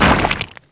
corpse explode; spells

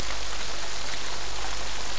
rain

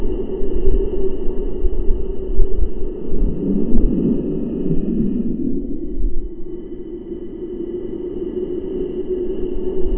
underwater

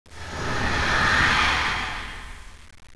demon spir

